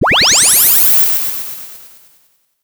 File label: laser